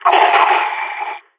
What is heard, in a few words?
death; Counter Strike; CS:GO; phoenix